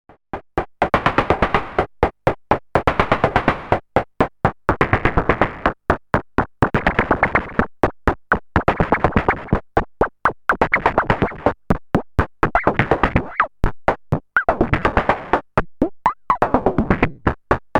Klavis Module Twin Waves Lof2 Modulates Osc1 Sine Phase Parameter Pitch Cross Modulation